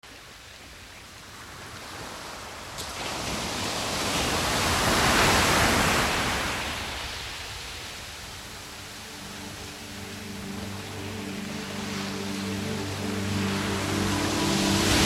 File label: ocean waves; Guy Fanguy